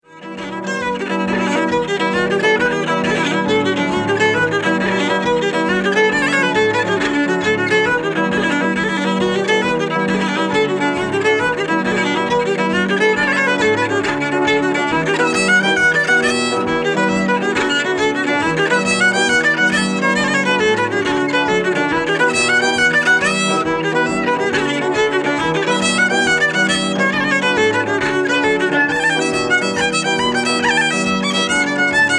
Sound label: Clay Pipe